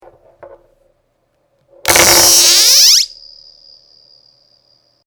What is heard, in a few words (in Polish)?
stol